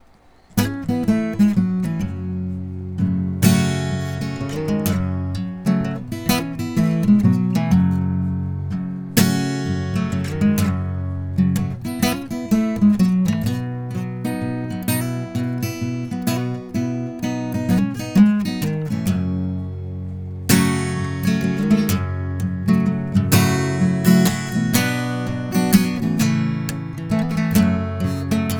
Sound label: ac gtr only